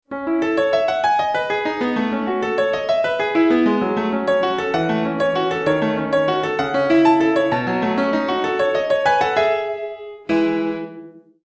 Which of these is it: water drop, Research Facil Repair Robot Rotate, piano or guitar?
piano